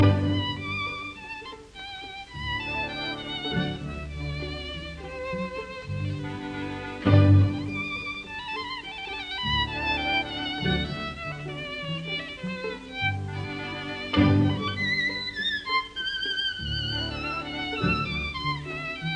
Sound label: Scherzando Allegro molto; Lalo; Symphonie espagnole; Op 21